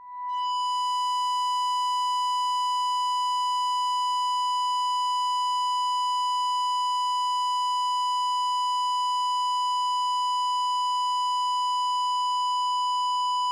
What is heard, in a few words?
EBow Guitar B5; chiptune; symfonysid